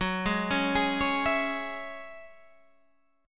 Gb 7th Suspended 2nd Gb Guitar Standard, Gb7sus2 Chord; arpeggiated; JGuitar